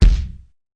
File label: Kick Crunchy, drumkit; REAL LIVE KITS; Osama Qureshi DrumKit